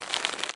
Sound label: shovel reel up